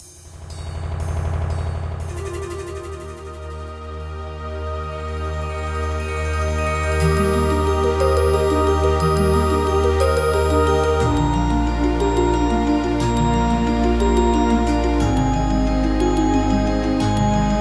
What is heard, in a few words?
Entrance Jingle; Lunar Isle